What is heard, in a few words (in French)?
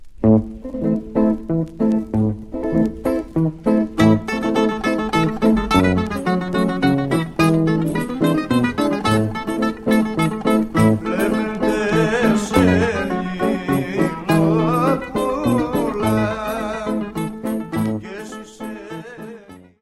Tsamikos, Grece